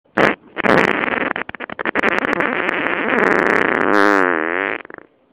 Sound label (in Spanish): Indeciso, pedo